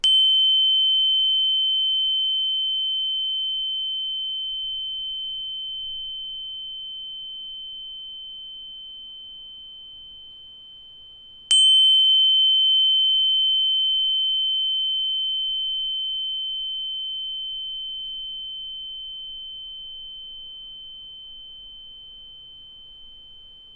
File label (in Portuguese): Pin Sol, instrumentos de quartzo; escutar instrumentos de quartzo